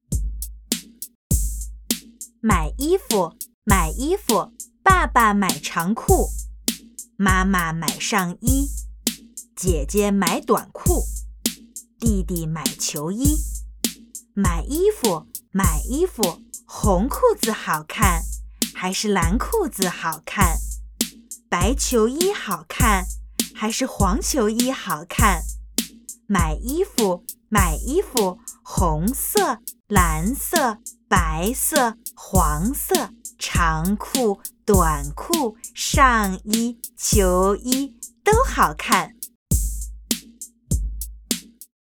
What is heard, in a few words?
Chant